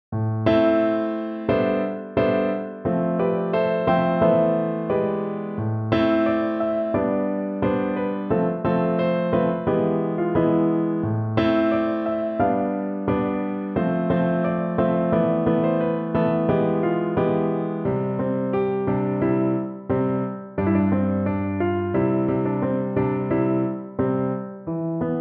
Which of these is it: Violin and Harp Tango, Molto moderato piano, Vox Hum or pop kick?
Molto moderato piano